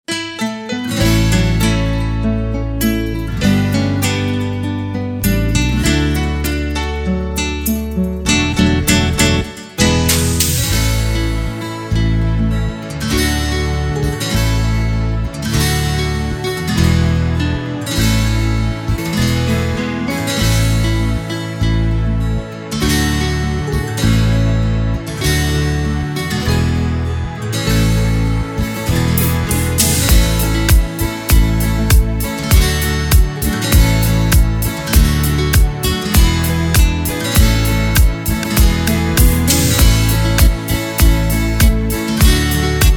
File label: Korg Pa3X